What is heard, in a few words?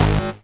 Synth Shots; Vengeance Samples